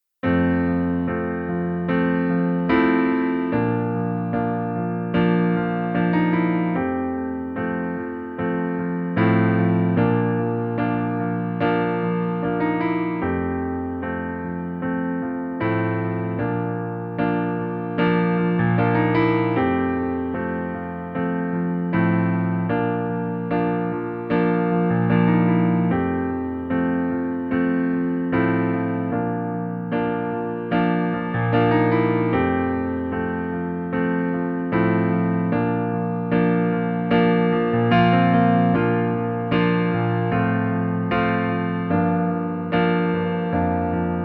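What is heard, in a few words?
piano playback F major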